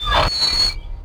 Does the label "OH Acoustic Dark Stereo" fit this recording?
No